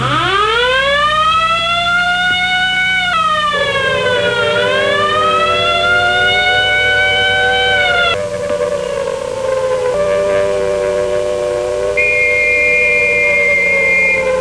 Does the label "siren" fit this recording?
Yes